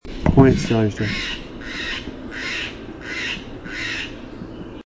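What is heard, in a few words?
Steller's Jay guttural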